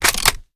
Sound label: kilo98 reload empty bolt close; gmod; viper